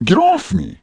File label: BUMPING; Messiah